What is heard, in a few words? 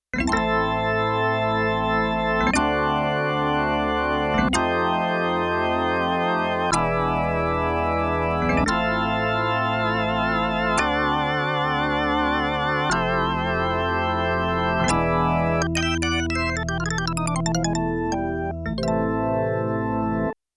C3, Yamaha TX16W; Rock Performance 2